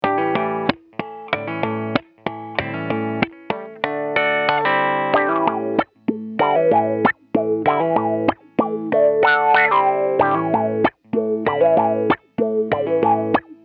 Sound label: filter; mobius